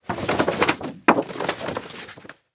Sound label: Foley Paper Rustle Construction Paper